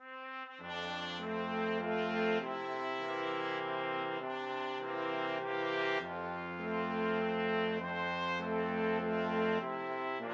BRASS,brass quartet

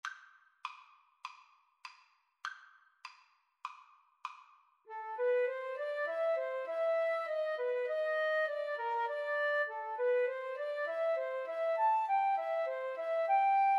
grieg mountain king, Flute Cello Duet

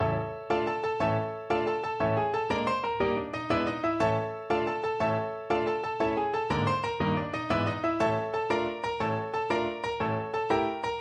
tarantelle piano; gottschalk

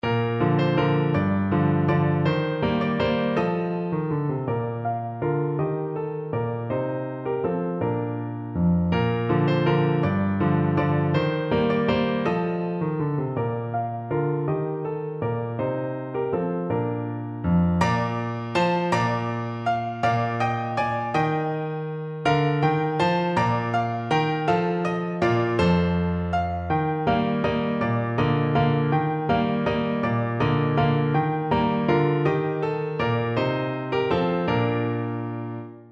lilliburlero, piano

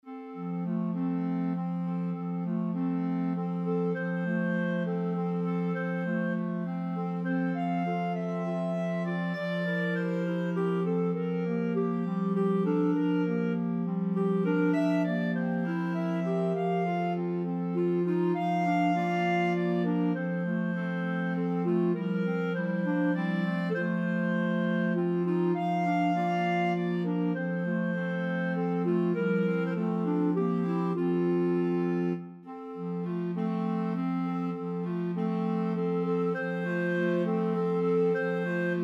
5 clarinet, clarinet choir; brahms

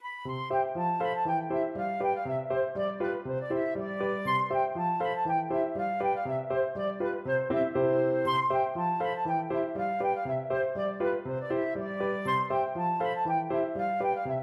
polka flute

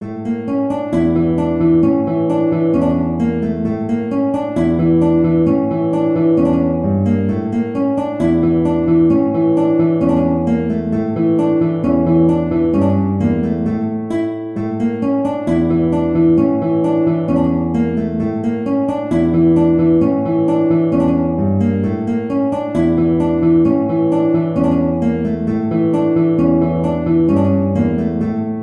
guitar

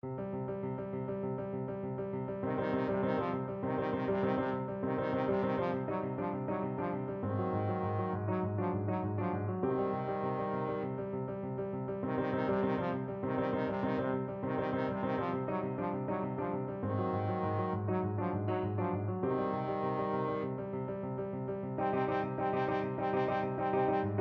trombone

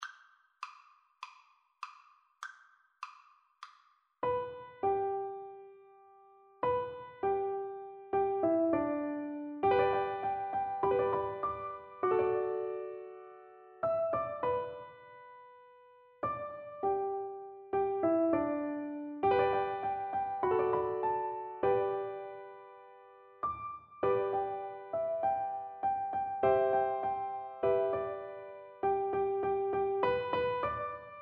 swing low, piano duet; Band Playalong

